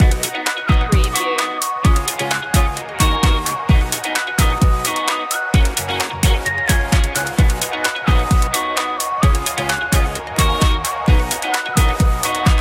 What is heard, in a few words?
happy feel